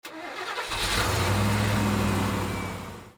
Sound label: pickup truck